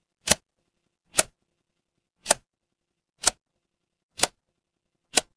mechanical switch

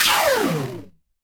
retro game laser

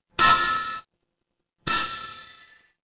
fire electric zap

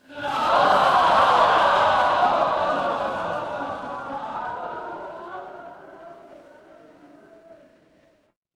crowd gasp

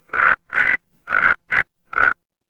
animal frog

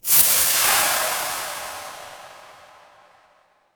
magic scifi spell

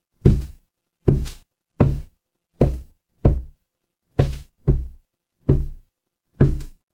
footsteps wood

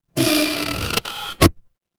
mechanical lever

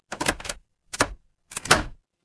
door lock

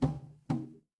impact bounce

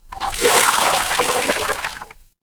water swirl

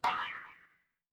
cartoon bonk

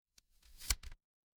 paper fabric page turn

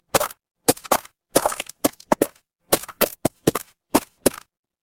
footsteps gravel

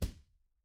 impact punch

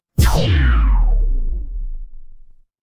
magic scifi laser

